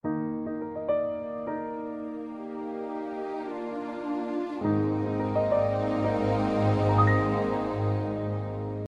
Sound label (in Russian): krasivyy zvuk sms samsung melodiya na smartfonah samsung